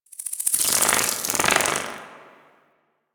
Winged Insect Passby; Ambience; Warframe; Quests; The New War; Narmer Outpost

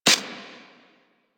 Impact Generic; Warframe; Caliber Chicks minigame; weapons; hit effects

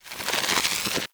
Blind Bow Draw; Powers; Fey; Saboteur

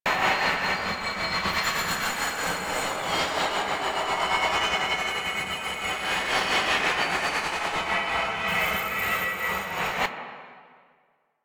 Hazard Fracture Idle Loop; Warframe; Duviri; Undercroft Hazards

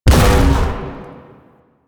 Void Dragon Beam Shot; Enemies; Abilities; Warframe; Duviri

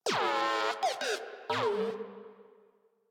Moa Compassionate Vocal Disagree; Warframe; Pets

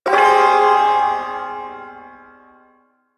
Ersatz Shawzin Phrygian Chord; Warframe; Duviri Ersatz Shawzin

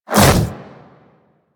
Melee Swipe Small; Enemies; Warframe; Infested; Grey Strain; Splitter

